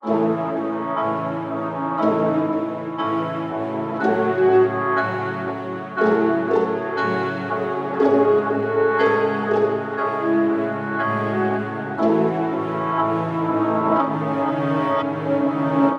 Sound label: Midieval Pad